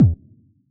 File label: BD Jungle; 155 DJX Kit